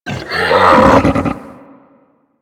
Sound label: Infested Juggernaut Vocal Idle; Warframe